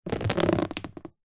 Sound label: water drips sudden on hard back book; nature